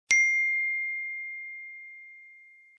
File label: notification